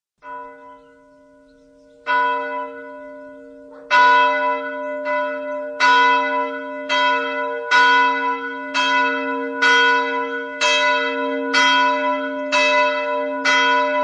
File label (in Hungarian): HARANGSZÓ REGGELRE; szignalok; SZEKELYUDVARHELY